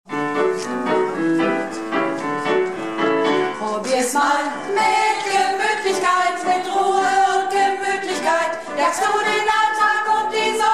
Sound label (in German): Sopran, Probe